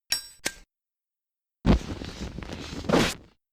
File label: full burn